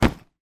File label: back pack small full heavy down on ground; foley; zapsplat